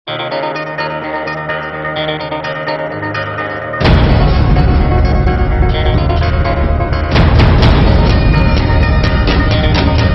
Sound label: Theme Telugu Ringtone; Panjaa